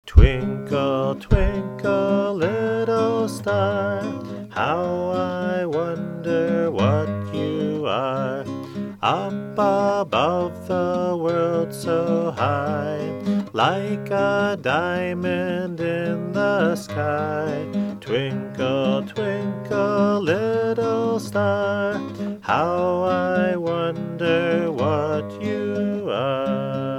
Twinkle Twinkle Little Star

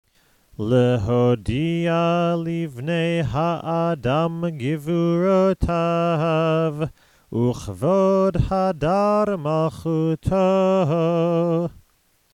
ashrei

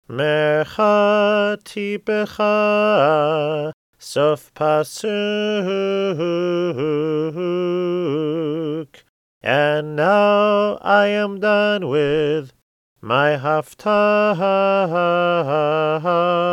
Trope for End of Haftarah

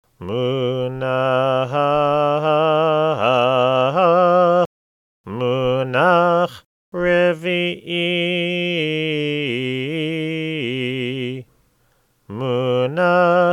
Haftarah Trope Revii

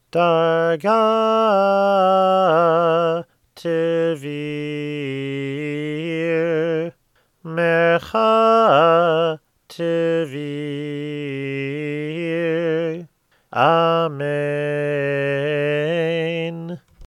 Torah Trope Darga Tevir

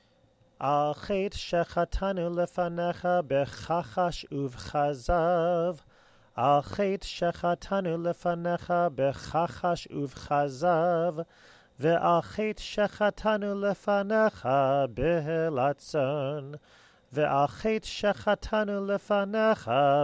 al chet tiku shofar

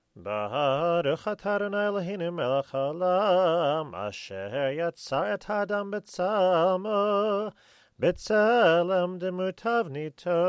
wedding blessing

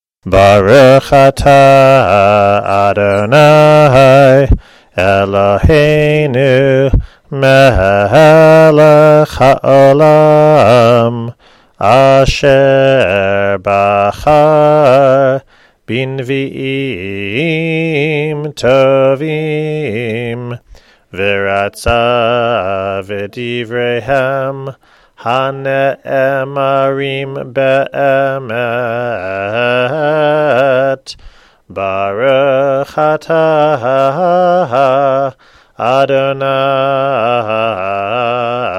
blessing before haftarah lower key

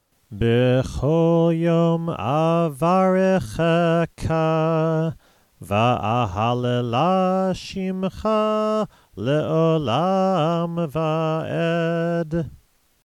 ashrei

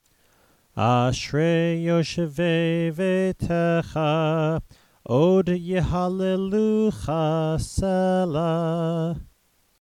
ashrei

